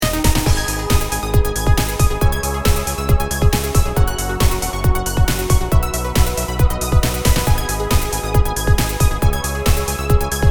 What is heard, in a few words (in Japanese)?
シーケンス; シーケンスありデモ